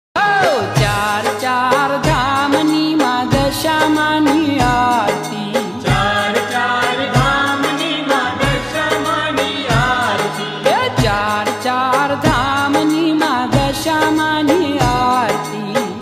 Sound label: Dasha maa ki aarti ringtone